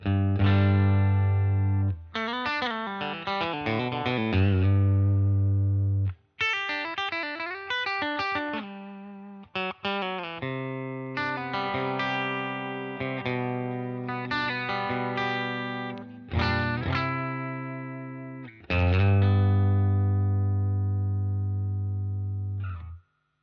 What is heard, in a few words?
Clean, guitares; La Feline